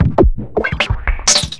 FILTER, Big Beat Drumloops; FILTERS; AKAI

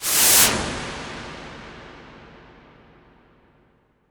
IMP WIPE, Cinematic Impact

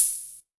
HH, Drum Mashines; EdgeSounds

